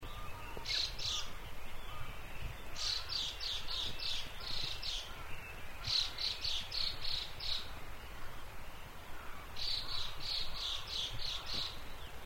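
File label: gabicho call, Chinese hwamei; jinaki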